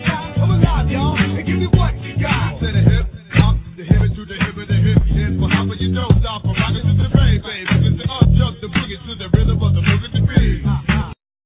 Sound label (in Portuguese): refrão rap original